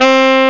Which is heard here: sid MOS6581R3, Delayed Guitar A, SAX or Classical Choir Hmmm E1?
SAX